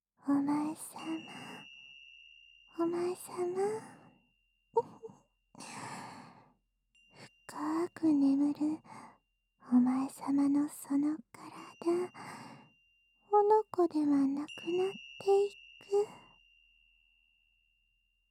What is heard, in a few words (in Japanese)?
オイル塗れのヨニマッサージ